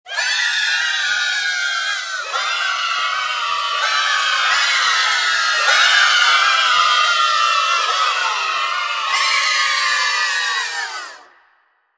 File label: Kids